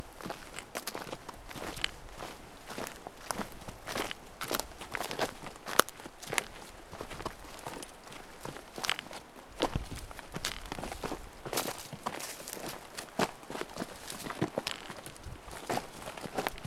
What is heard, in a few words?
Hiking